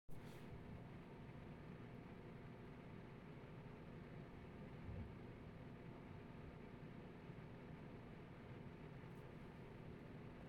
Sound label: server noise